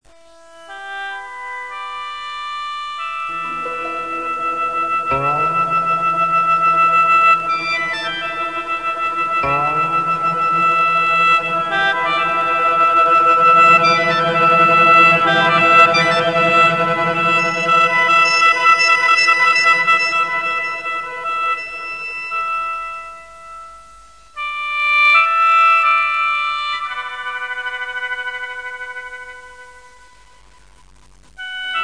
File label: sheng